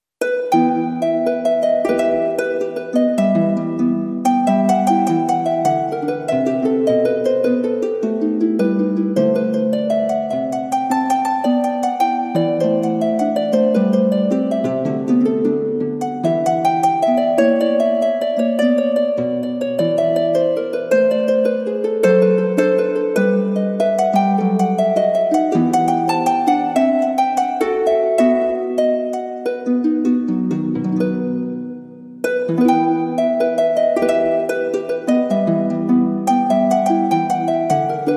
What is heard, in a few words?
Gigue, Arpa Version